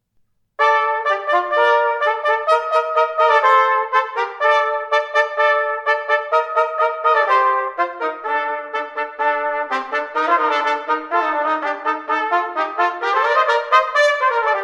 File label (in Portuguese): Frigio Deuterus Duetos Trp